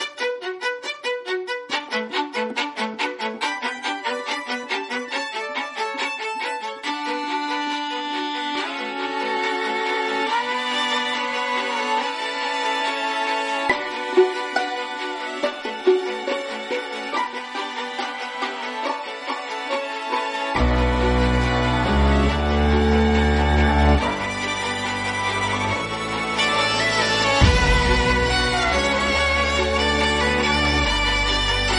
violin instrumental

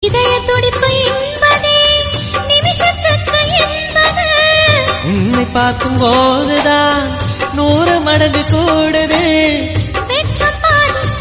bgm

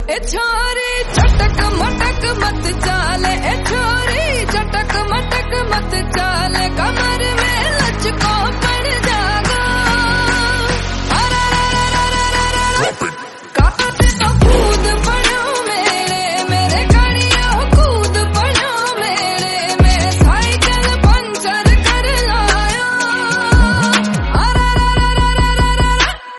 haryanvi

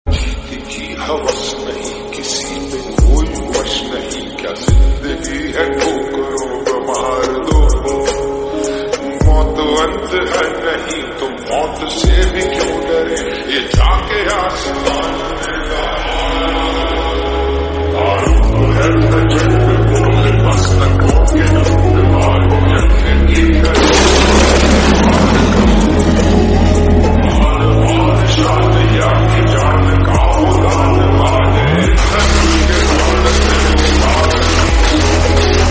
lofi

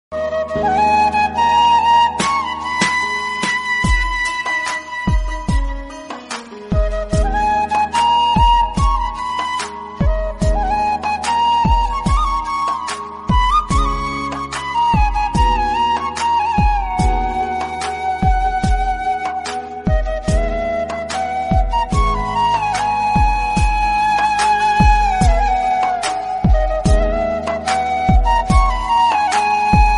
Flute

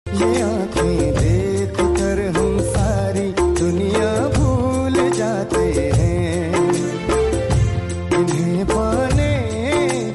romantic hindi song

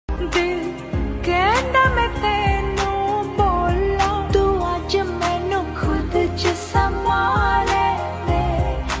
female version punjabi

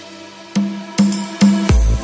Vivo Saw Message

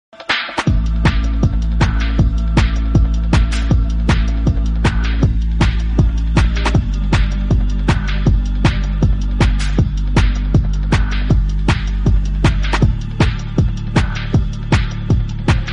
gypsy background music instrumental love

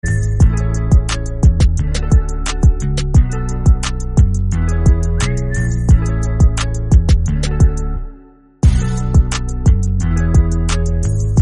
instrumental bgm

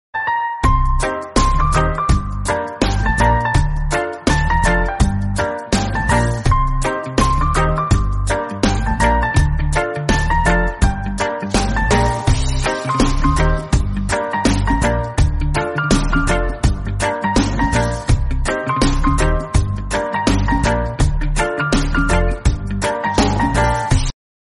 reggae background music

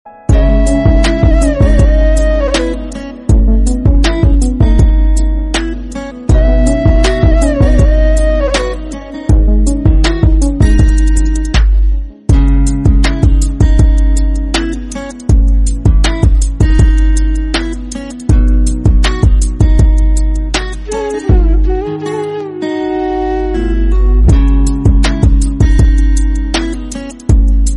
instrumental song

